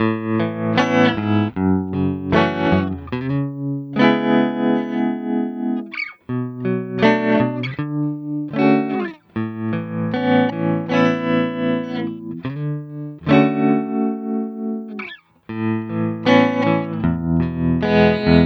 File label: pedals trem